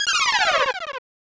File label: Beam